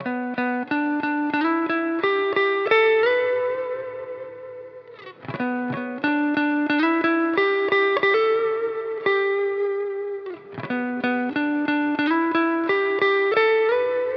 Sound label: 90 BPM E Min Lead Guitar; Loop Stems; Cymatics; Miami Guitar Pack - Pt 1; Broken